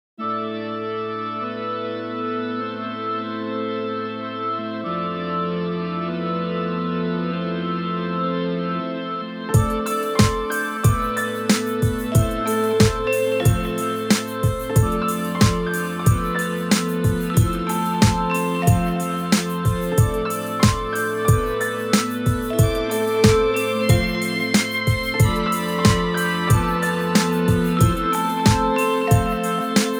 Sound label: Mellotron Micro Organ Piano Vibe High String